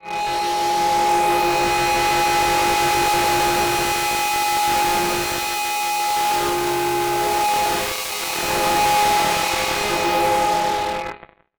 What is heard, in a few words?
Transition Noise Static